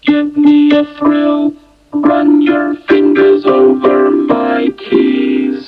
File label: piano